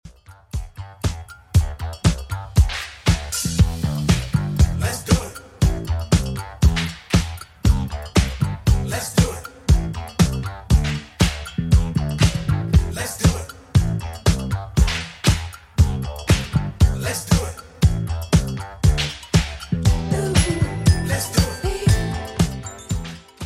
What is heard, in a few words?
80s Redrum 118bpm